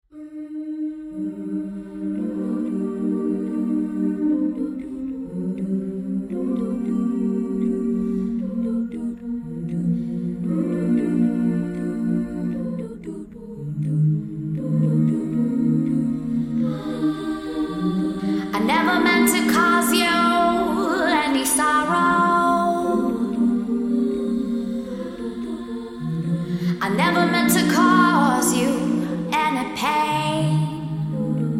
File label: ACAPPELLA